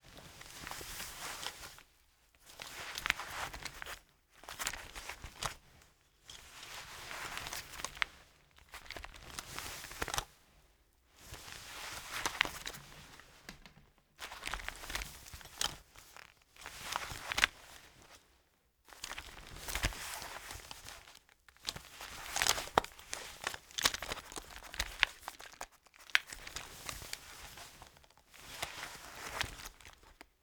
Foley Headphones Handling; Slusalice

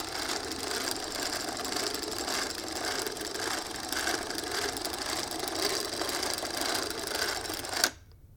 Film Camera

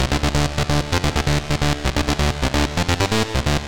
130 Bass Loop C2

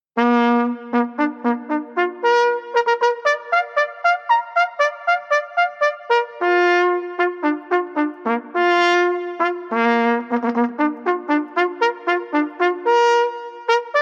Fanfare

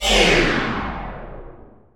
Launcher

